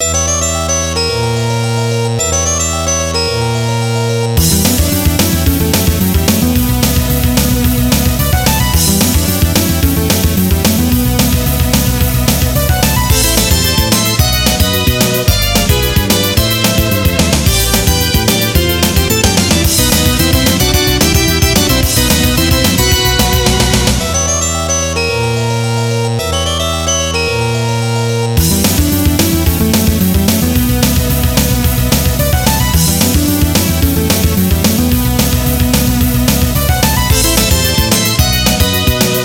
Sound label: Monster Dance; music arrange akumajo dracula i ii for family computer; castlevania; castlevania ii simon s quest